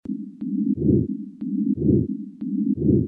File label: Pericarditis Friction Rub